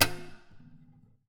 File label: Classical Guitar Extended Technique Plink